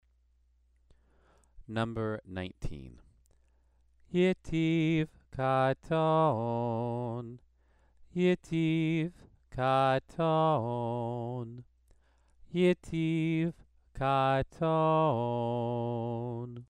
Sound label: Torah trope exercise